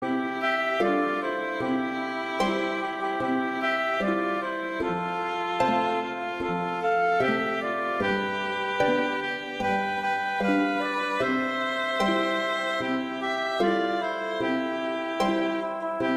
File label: Jingle Bells, Christmas